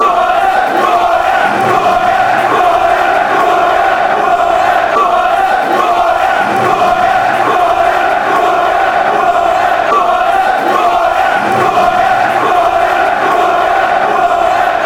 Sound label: who are ya, fan chants